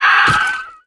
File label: harpy